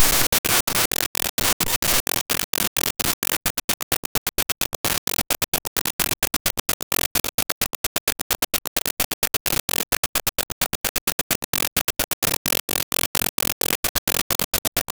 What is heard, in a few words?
tadatadatadatadatadatadat, rhythmic